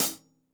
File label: hihat - closed side; JazzFunkKit